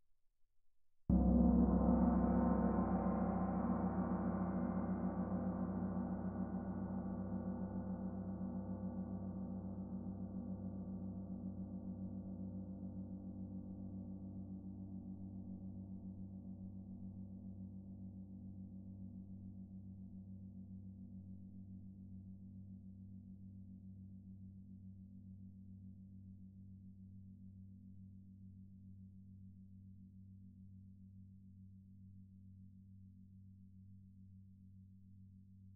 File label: tamtam mf, Percussion; gongs & tamtams